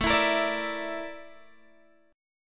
A sharp Major 7th Flat 5th A

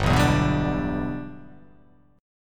G 6th

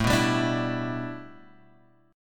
A 7th Suspended 2nd Suspended 4th Guitar Open F; A7sus2sus4 strummed

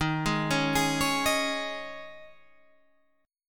Ab Suspended 4th

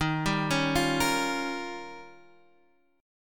D sharp 9th D sharp, Guitar Half Step Down

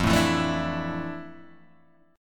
F Major 7th Add 6th, guitar chord; FM7add6 Chord {1 0 2 2 3 0} strummed

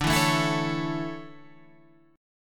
D Major 7th Suspended 2nd, Guitar Half Step Down

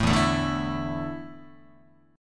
E 7th Suspended 4th, Guitar; E7sus4; strummed

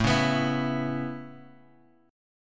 A Major Add 9th A Guitar Open F, chord; 9,8,11,11,x,x; Aadd9 strummed

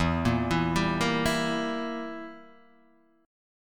E Diminished down Guitar Open D

